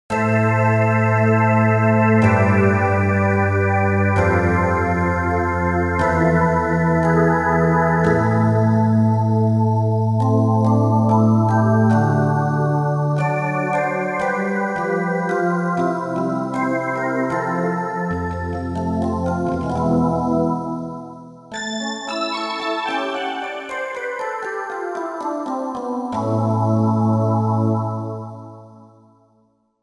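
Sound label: Staccato Heaven; Keys; Roland SRX-07 Ultimate Keys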